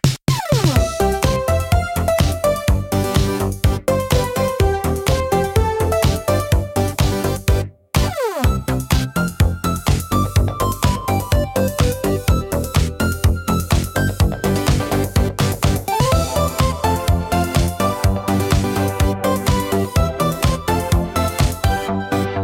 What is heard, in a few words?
jingle pop; 効果音・ジングル(ショートBGM)